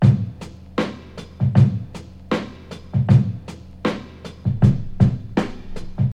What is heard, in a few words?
79 bpm drum loop C# key, Free breakbeat sample - kick tuned to the C# note